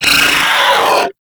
Swarm Vocal, Enemies

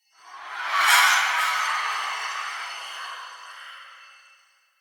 Smithy Soul Breath; Ambience; Soul Plane

